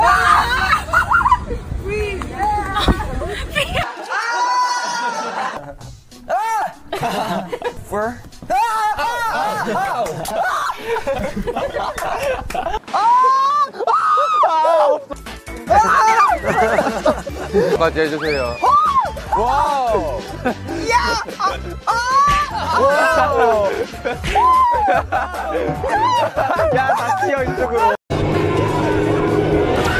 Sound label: Screaming